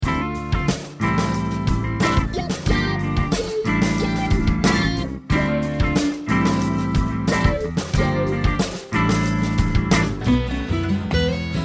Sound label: montage of guitar styles